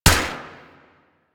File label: Single Viper Fire, weapons; DE; Warframe; Wraith Single Viper